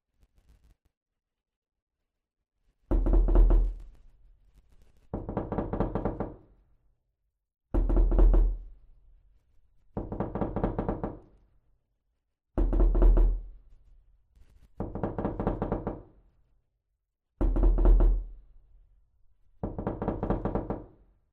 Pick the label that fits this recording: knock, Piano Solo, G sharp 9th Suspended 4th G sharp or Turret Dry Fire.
knock